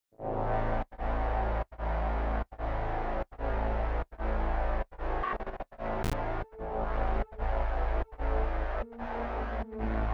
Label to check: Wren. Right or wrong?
Wrong